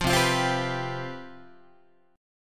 C Major 7th Suspended 4th, C, down, Guitar; strummed

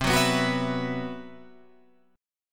C Major 7th Flat 5th C

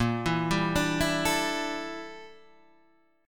G Minor 6th Add 9th Bb

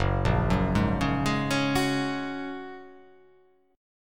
Gb Major Add 9th, Gbadd9 chord; arpeggiated; JGuitar

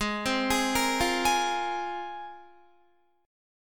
Ab 6th Add 9th, Guitar; arpeggiated

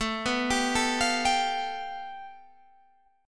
A 7th Suspended 2nd Sharp 5th

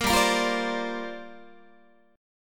G Minor 11th G down Guitar; Gm11 strummed; 13,15,13,15,16,13

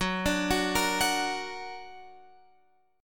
F sharp Augmented F sharp Guitar Open F; arpeggiated; x,13,14,13,13,13; JGuitar

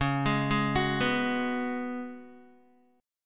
C sharp 7th Suspended 4th C sharp, Guitar; C#7sus4; arpeggiated; tuning=4F,4A,5C,5G,5C,6E; guitar chord

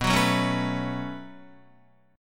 B 7th Suspended 2nd, down, Guitar; B7sus2; strummed; JGuitar

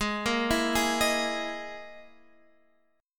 E Major 7th Flat 5th G sharp

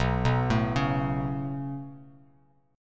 B 7th Suspended 2nd, Bass Standard; B7sus2; arpeggiated; JGuitar

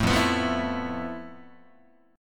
F Major Sharp 11th, Guitar; strummed

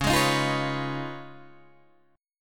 Db 9th, Guitar, Open D

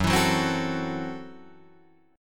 Gb Minor 7th Flat 5th, Guitar Drop D

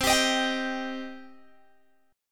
C Minor C down Guitar Open G

